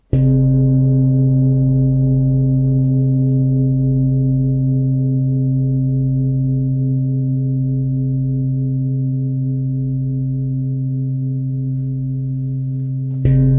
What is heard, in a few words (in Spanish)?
CUENCO TIBETANO GIGANTE